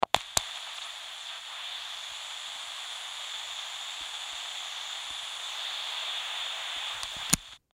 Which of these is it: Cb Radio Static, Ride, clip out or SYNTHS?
Cb Radio Static